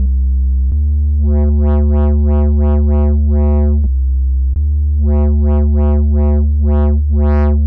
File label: Medium Curve